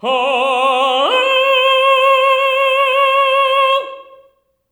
TEN C4, TENOR; TENOR OCT

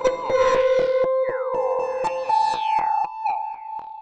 Discordant Loop (120BPM); cinematic drama; Loops; musicradar

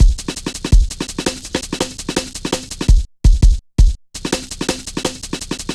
Rollin Shaker, Drum Loops 166.5; Zero-G Jungle Warfare 1, 2 & 3

